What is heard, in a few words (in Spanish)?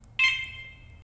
activacion, ringtones de alarmas; Sonidos Positron